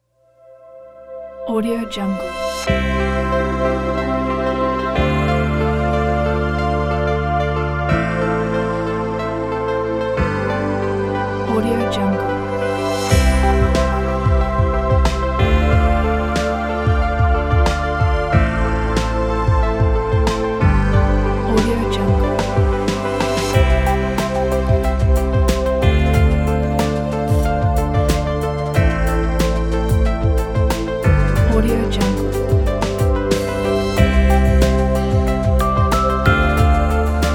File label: clarity bells; rarelufs